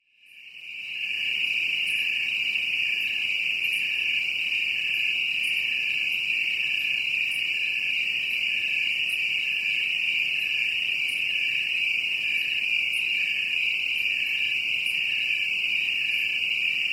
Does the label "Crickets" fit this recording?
Yes